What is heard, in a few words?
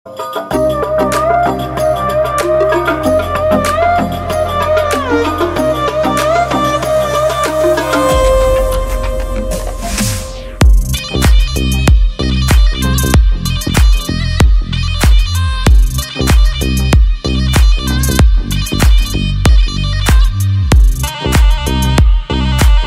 bass full music; the south soul